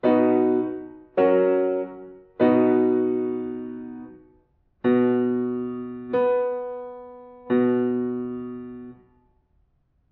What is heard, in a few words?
diatonic collections